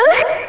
GOBLIN; GoD

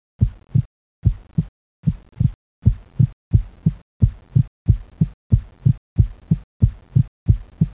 heart

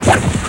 drive; Jack

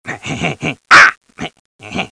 heh heh

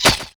arrow flesh

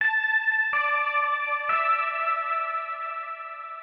synth house 125 A; eJay; Keys

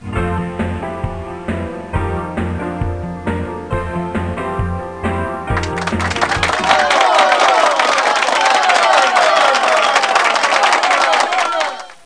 blimp; GEOGRAFIA

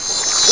shimmer; PUZMANIA

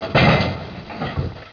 BAT HIT; Lords2

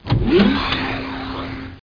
spaceship door

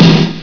Nuke Bang; RoboWar

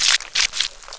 squelch

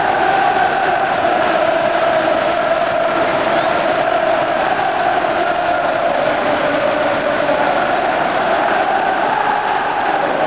chants; StarsLeague

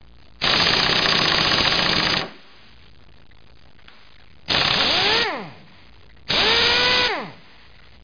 ratchet; Broderbund Print Shop Multimedia Organizer Deluxe Edition

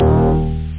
SynthBass; Loop Amiga; instrument-sample

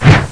wings

